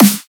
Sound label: Dubstep Snare; Ghosthack; Dubstep Sound Arsenal